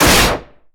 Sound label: Blow